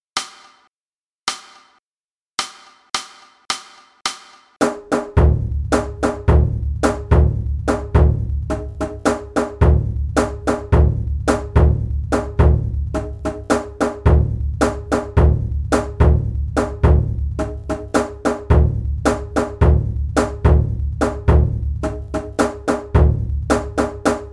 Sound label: taiko; atsuta; kasadera hayashi